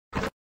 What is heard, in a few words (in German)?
buch aus dem regal nehmen sound; The Dream Machine